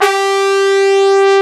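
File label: HORN SECTION; Greytsounds; Scott Peers Sound Engineering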